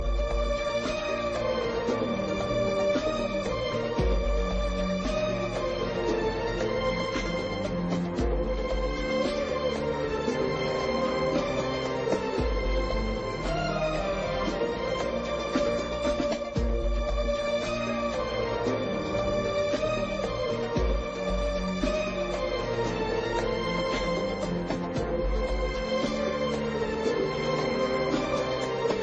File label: Sad Instrumen